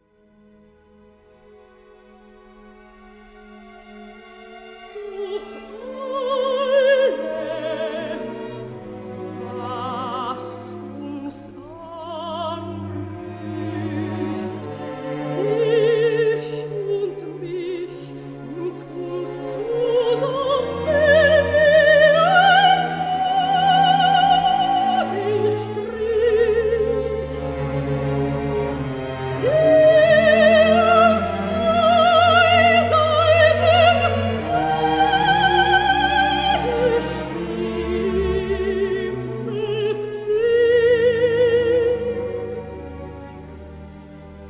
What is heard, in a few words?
for Soprano and Orchestra, Love Song; Die Liebenden; I Liebes Lied